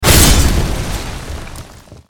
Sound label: weapon zimen